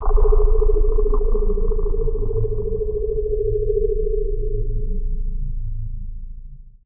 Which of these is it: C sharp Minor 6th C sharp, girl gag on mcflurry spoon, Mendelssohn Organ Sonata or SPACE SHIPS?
SPACE SHIPS